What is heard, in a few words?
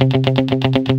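GUI 120, guitar; Best Service Gigapack 2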